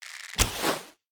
Bow launch

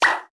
pop; lego my style preschool

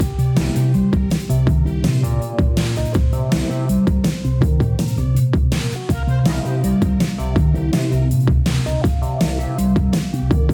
DnB Test Song no crash; hot lava; ios macos windows

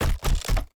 crate open intro; Animation Throwdown; cardbabies

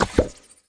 Collect Orb; Rabbids Big Bang; Android iOS Windows gamerip 2013

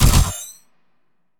Sci-Fi Weapons Machine Gun Single Shot

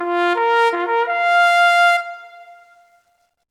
Ewok Horn; lego star wars the skywalker saga; ps4; xbox series xs

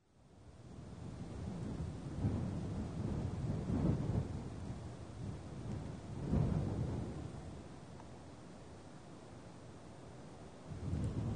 ambient wind; Mabinogi

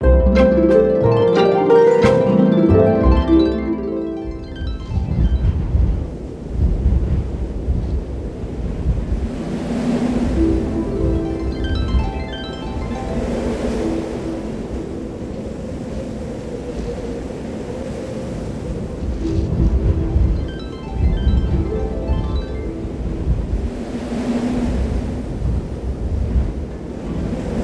Lake-top Trampoline; active life outdoor challenge

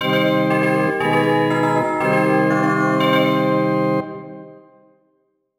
Church Door Opened; Banjo-Kazooie

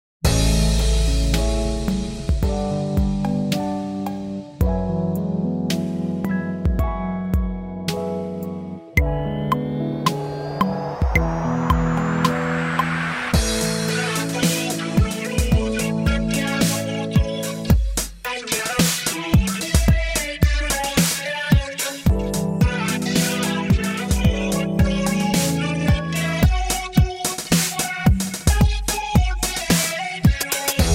Battle Dance; Digicalized; Android

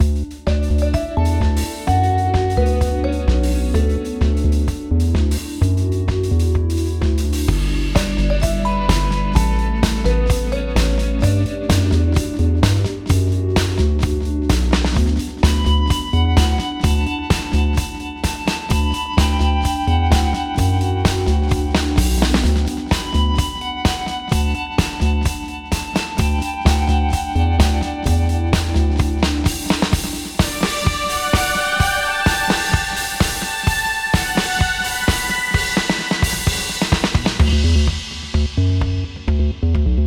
Fun Dreamy Lingerie; Negligee

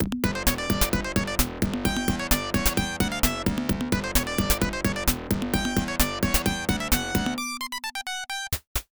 Unused Jingle (Lose...); Hebereke no Popoon; arcade

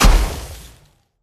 Frosty Impact Loop start; Hero Eiskalt

